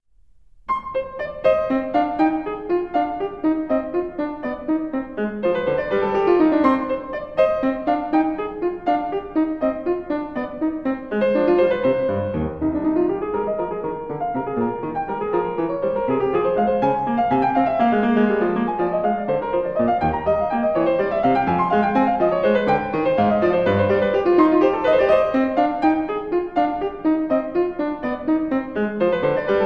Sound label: Partita in C minor Rondeaux; Johann Sebastian Bach